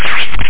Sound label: FROGSF